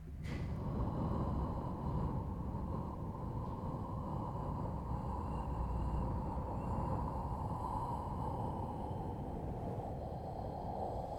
Wind

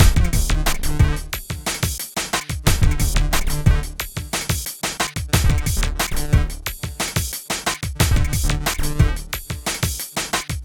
Game Loop

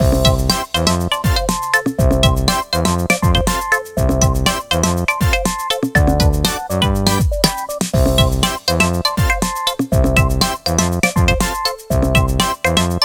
Fun Loop

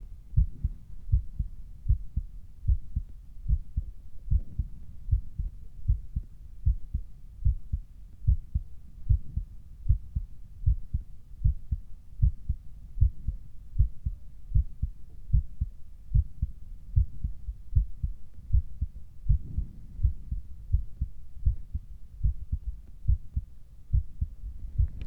Human Heartbeat

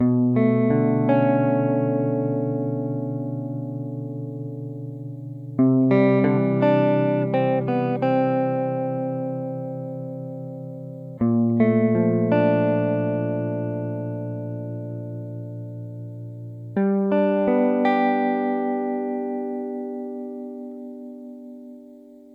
Guitar

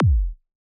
Psy Kick

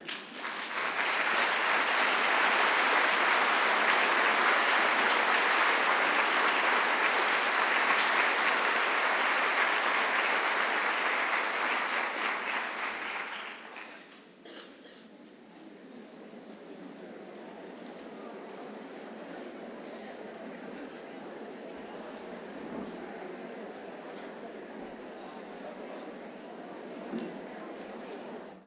Clapping Then Leaving

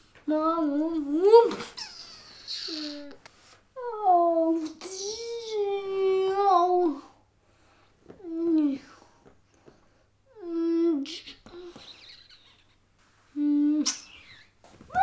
8 Year Old Child Is Playing

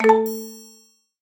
Marimba Blink Complete

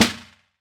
Snare

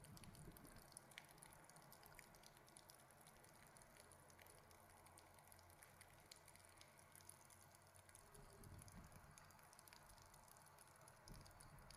Crystal Tree

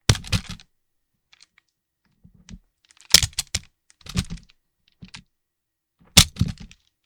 Pistol Or Revolver Dropped On Floor

